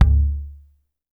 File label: WD, DRM HITS; Spectrasonics; Liquid Grooves